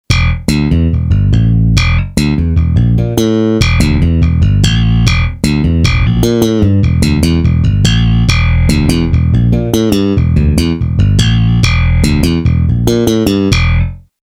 slap bass

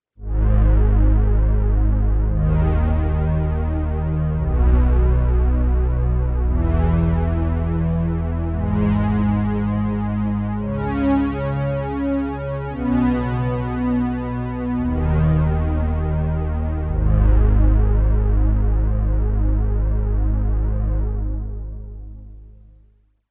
Brass & Strings

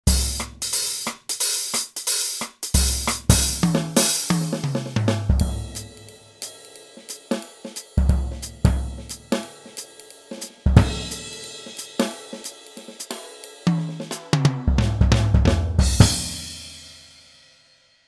jazz

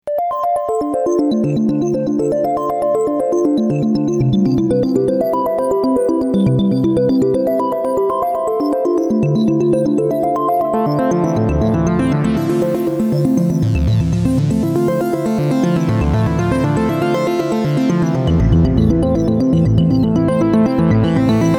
v synth xt arp drops

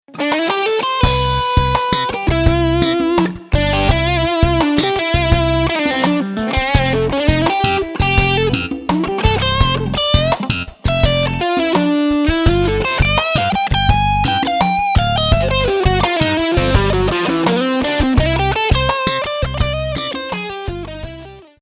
patch demo

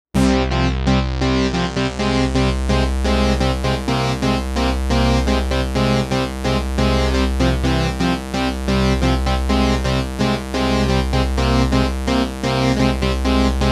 NEG Pluck

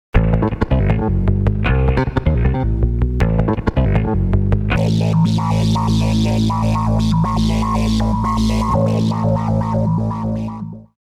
slap

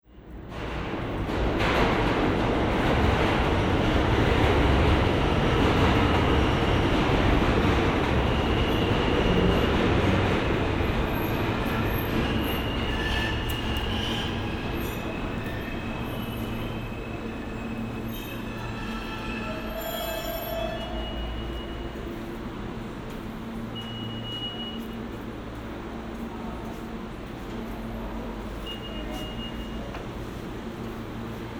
nyc subway 1

